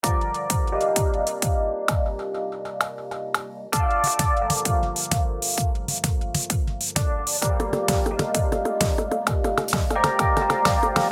Trance; Hero Solar Atari Teenager

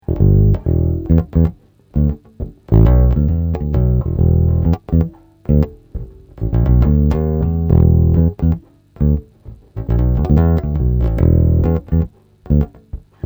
Treble Cut

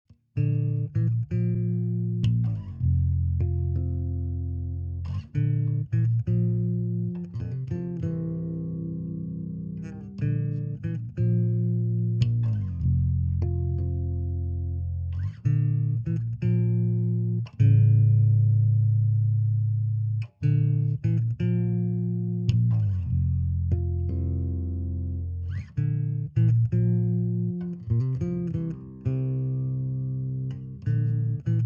Flying V HiLoBoost Chords

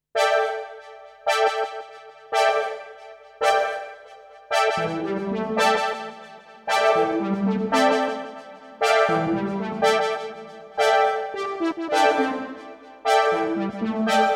synth panning counter; human legaue

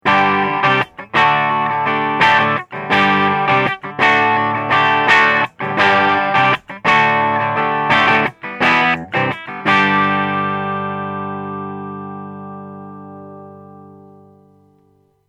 EL84 Clean

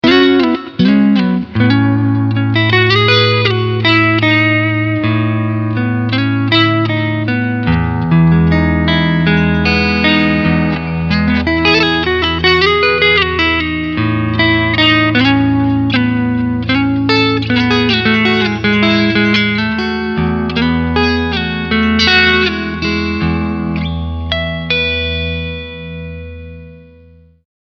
Clean Front SC

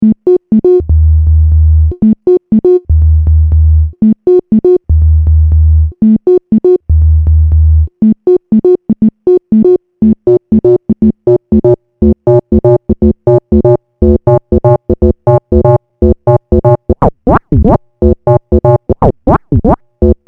Dark Energy Filtersinus + LFM

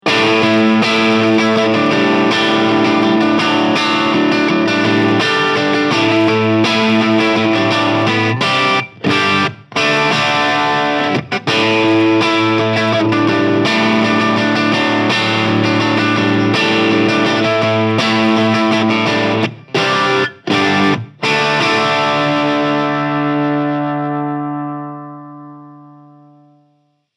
Marshall 1992 Channel 1 Crunch

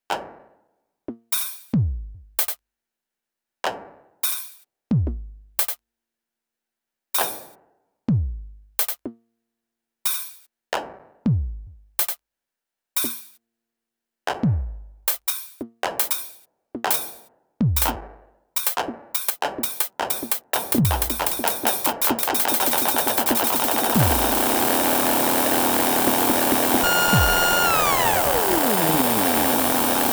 Glitch 909

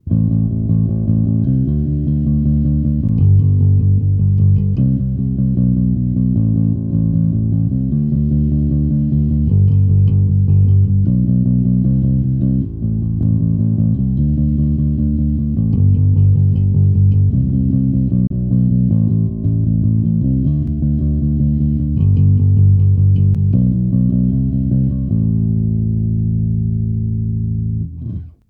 Warwick rock pick comp

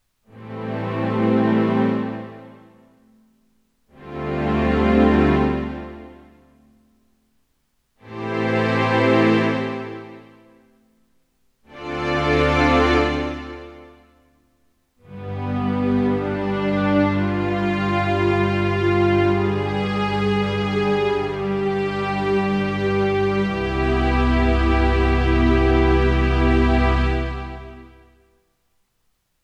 Roland RD-88 Slow Full Strings

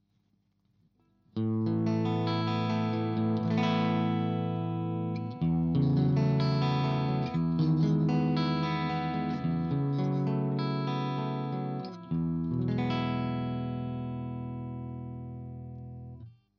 clean Position SM57

